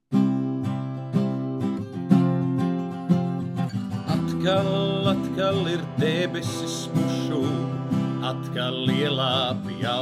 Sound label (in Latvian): dziesma ar gitaru